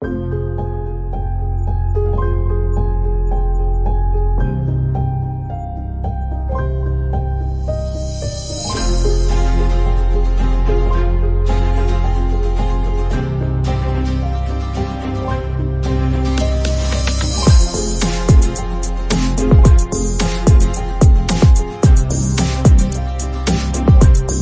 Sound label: dramatic cinematic background music ringtone; White Records